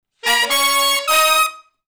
Shuffle Blues Riff (Eb) high unison, Horncraft for R&B Sections